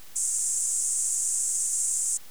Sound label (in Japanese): 音色的高さ fc=8kHz